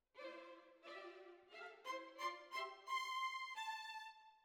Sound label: Violins Over